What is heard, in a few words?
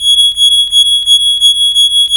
vibration; Zero-G Ambient 1